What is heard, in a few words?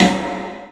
PA DEEP L, CLUB SN VRB; 3D Sound; 3D Drums